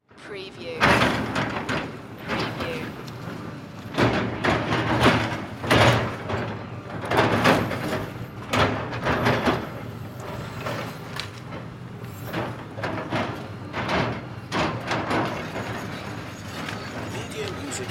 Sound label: Construction Site